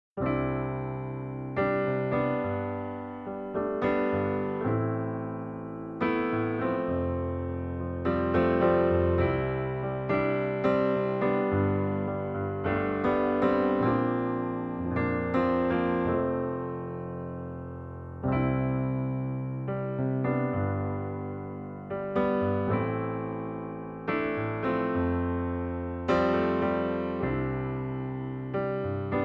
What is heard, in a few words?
Yamaha C1 Acoustic, piano